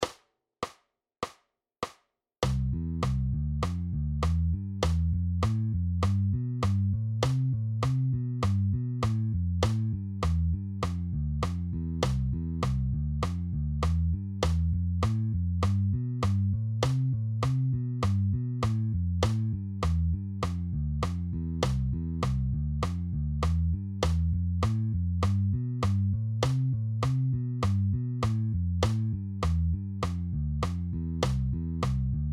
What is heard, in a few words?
100 BPM Play Along